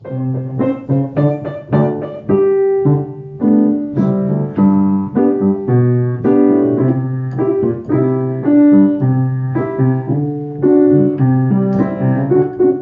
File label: accompaniment